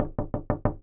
door wood knock

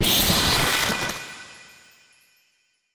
magic ice

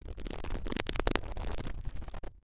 clasped, battle

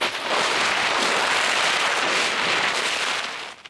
pushed back

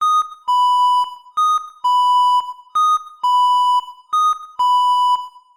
acoustic signal

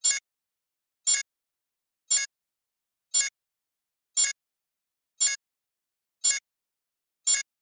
finder

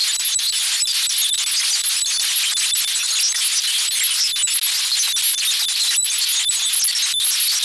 magic electron

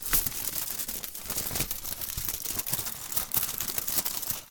aluminum foil wrap

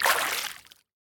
sprinkle water

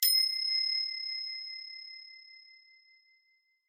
tin, anime

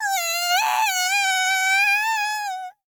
girl ueeen

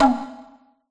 kotsudumi

